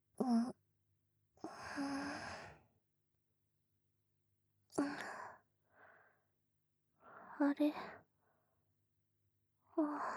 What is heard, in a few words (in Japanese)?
寝息 トラック 二度寝用, ASMR